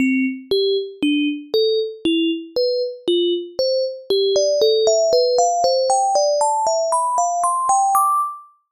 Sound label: korokoro, jingle